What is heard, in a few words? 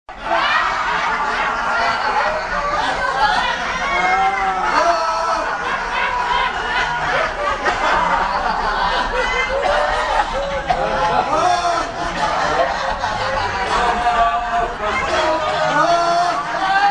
laughter